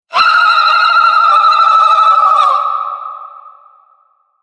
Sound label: Scream Banshee, monster; Monster Sound Effects; horror sound effects